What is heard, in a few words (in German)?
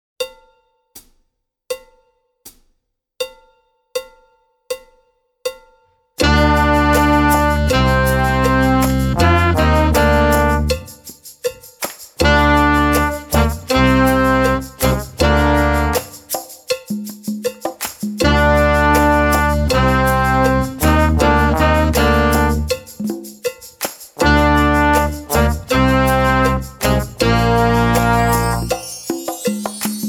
Reggae ohne Drums